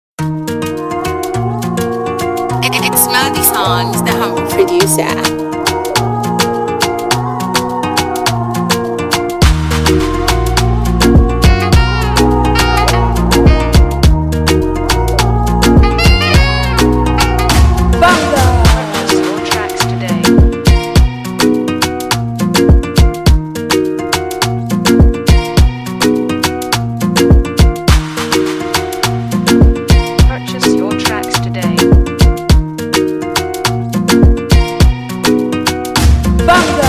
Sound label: ZANKU TYPE BEAT